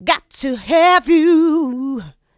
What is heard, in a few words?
voc, female vox, Vocal House